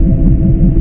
ONBOARD; duke

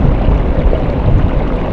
pipes; ambience; autonomy lost

